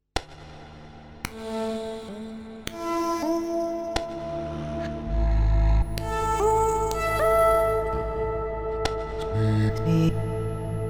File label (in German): Synthesized Loop Längen Mod